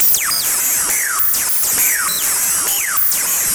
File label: Lazer Face 135BPM, sci-fi Beats